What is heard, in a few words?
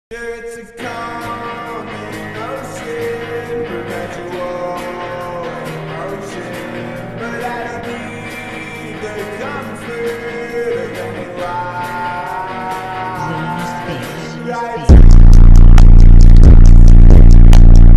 Beats Superbass